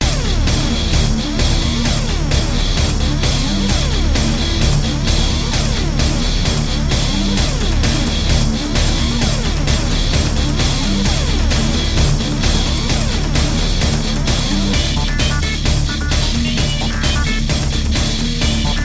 Ring Music

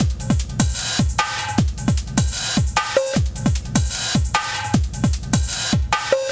boss fight drums

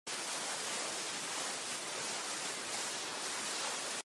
Slide snow

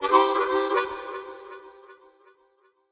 End Sting Addition; Void Bastards; Krell

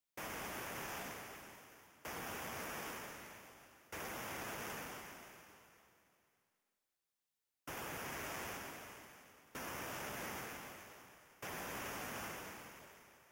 NOISE; rain world

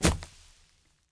foliage land

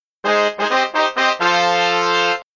Shoot Again; Indiana Jones The Pinball Adventure; williams pinball

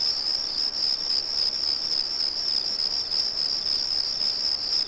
Twitch Amb; Levels Hub Mansion; Twitch Escape; Twitch Amb Loop

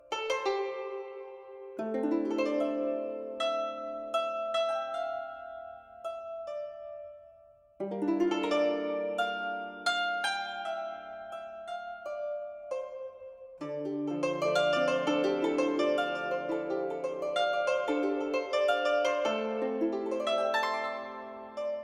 Guzheng Solo; Royal Alchemist

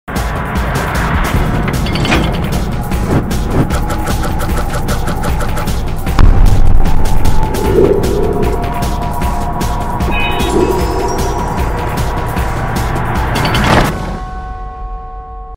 Death Cinematic Music

